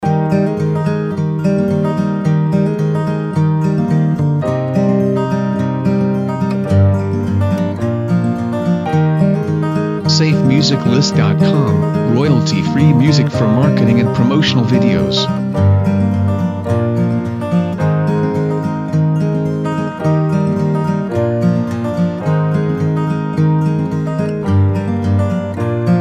Optimistic Acoustic Guitar Loop; SafeMusicList

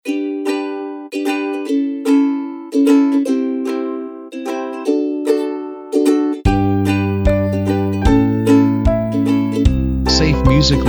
Positive And Happy; SafeMusicList

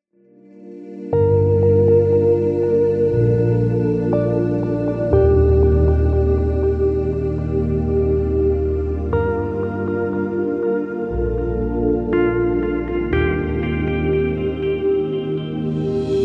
Atmospheric Mood; SafeMusicList

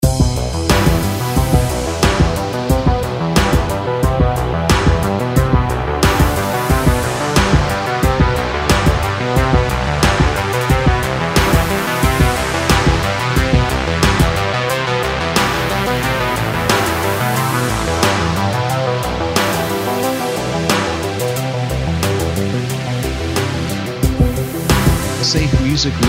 Mysterious Things Loops; SafeMusicList

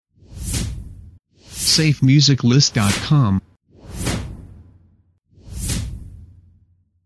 Short Swoosh And Hit

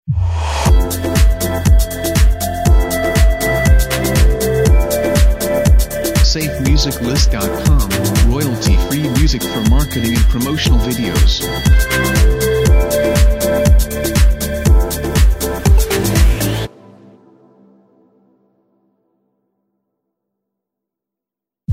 Upbeat Electro Fashion Intros

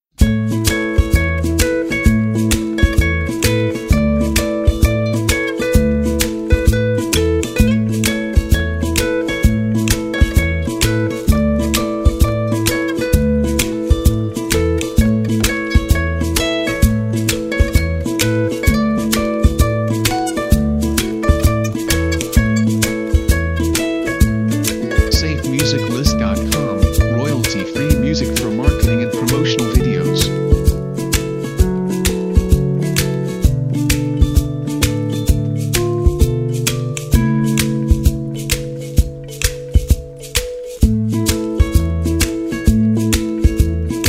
Walk Along The River

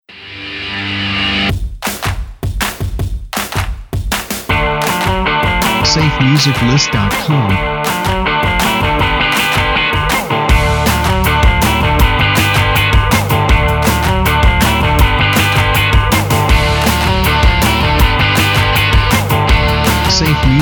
Get Ready Intro

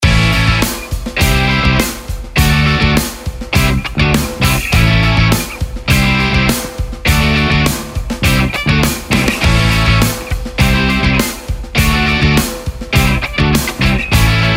Indie Rock Party; SafeMusicList

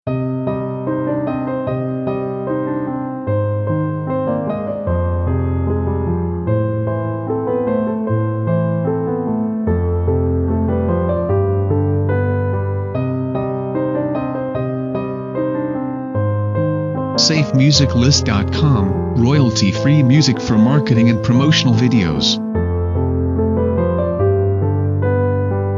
Piano Solo

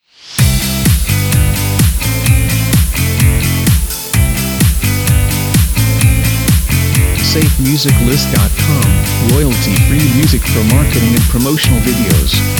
Rock That Party Video Bumpers

